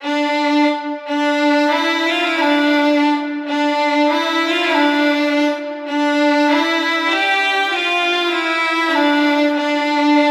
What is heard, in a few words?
Solo Violins - Dm 100Bpm; Construction Kits; STEMS; Ghosthack; SkyRealm; Kit 26 - Homeward Bound